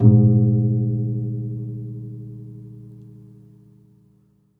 Classical Guitar Marimba Hit, Classical Guitar Extended Technique